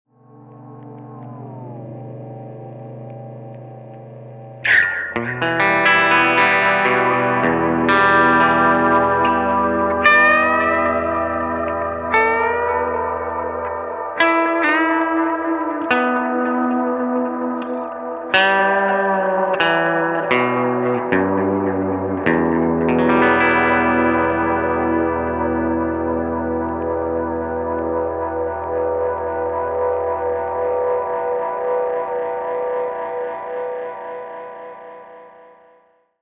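Vintage Oil Can Echo, Both playback heads are engaged with a high degree of regeneration and a bit of modulation to produce a moody atmosphere.; A Fistful of Echoes; Olivera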